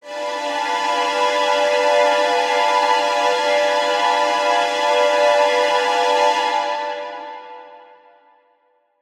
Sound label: Suspense Strings High, horror strings